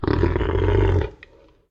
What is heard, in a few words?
growl, Shadow giant grows and grumbles; Hound of Tindalos; SyrinScape; Iris hill manor